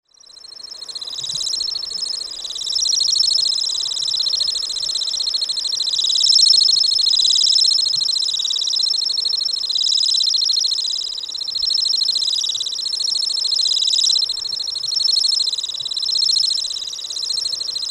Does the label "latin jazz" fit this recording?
No